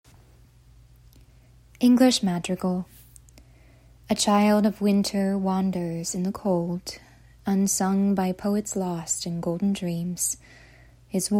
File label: English Madrigal